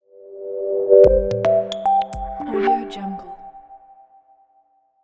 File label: soft and elegant logo